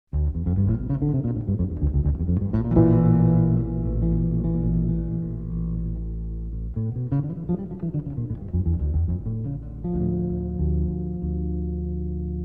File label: chromatic